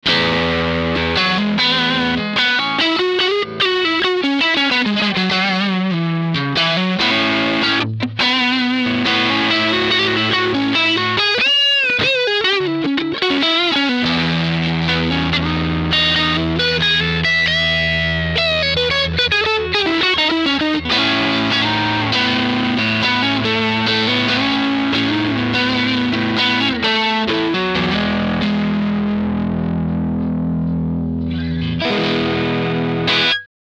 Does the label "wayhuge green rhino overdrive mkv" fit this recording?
Yes